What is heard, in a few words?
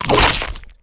infector bite; haggy wuggy bot